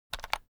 foley office keyboard external shortcut short; smartsoundfx

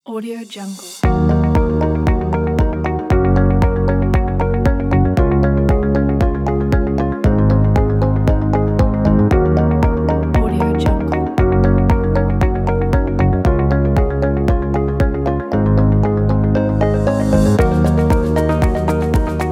ambient music

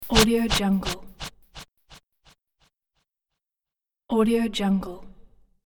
whoosh delay pulse swoosh

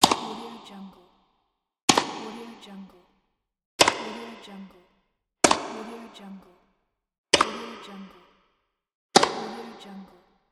tennis ball hits

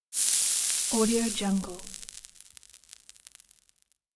ice burn; moonaudioproduction

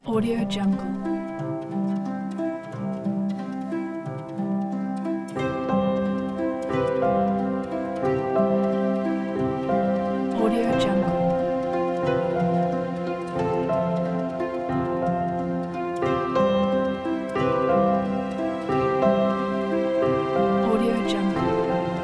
inspiring beautiful piano and strings

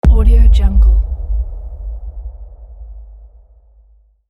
noise background hit

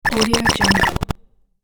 retro glitch 8bit

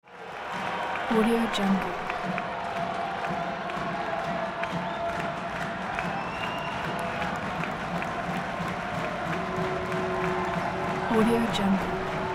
crowd cheering gym

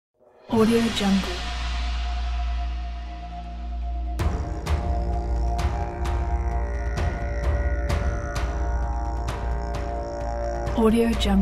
cinematic war suspense

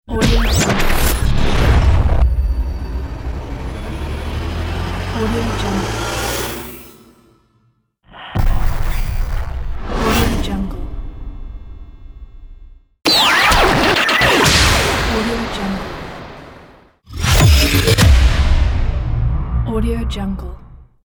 radio imaging pack

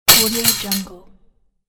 strong fall metal crash

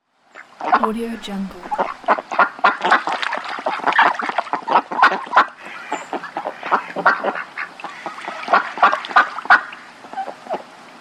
ducks

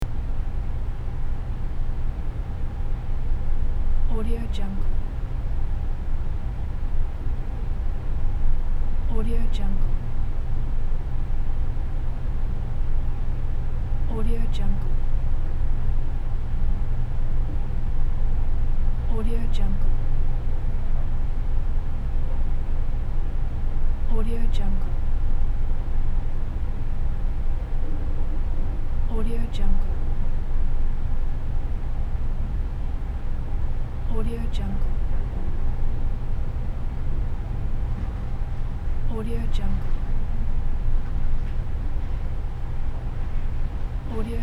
room ambience

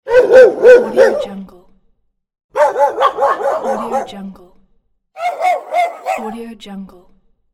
barking dog

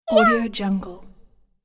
cartoon character happy shout

